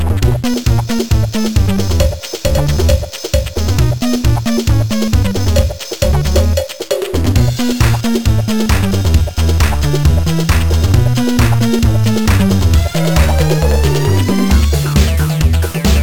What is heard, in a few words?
Disco; Urban Yeti; gba